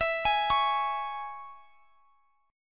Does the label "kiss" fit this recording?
No